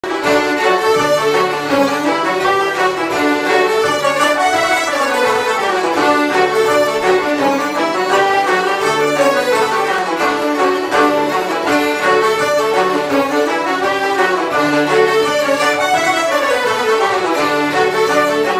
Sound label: Galway Hornpipe